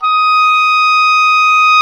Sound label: OBOE, Orchestral Winds, Oboe mf f